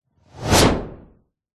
sound design whoosh transition swoosh med powerful short fast